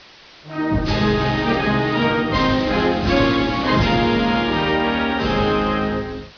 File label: swedish anthem